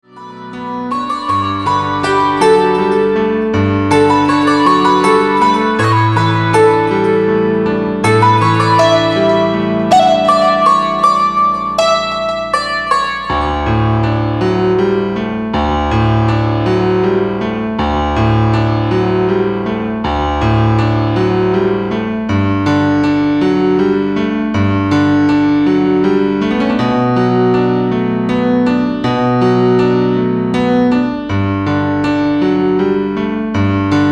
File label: piano